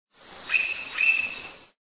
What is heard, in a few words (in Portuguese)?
Celeus flavescens Pica pau de cabeça amarela